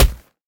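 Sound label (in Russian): негромкий слабый удар кулаком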